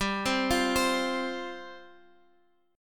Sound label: G 7th Suspended 4th G down Guitar Standard; G7sus4 arpeggiated; capo=2